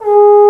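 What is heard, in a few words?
Trombone p G#3; Multisamples; Digital Sound Factory Virtuoso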